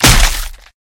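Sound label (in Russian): raspakovka sukhogo individualnogo paika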